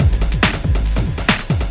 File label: 808 Roar at 140 b; beats; Sonic Foundry (Sony Creative Software); Ugly Remnants VOL-2